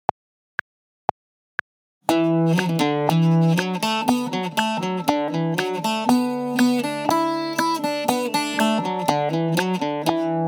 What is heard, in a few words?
120 bpm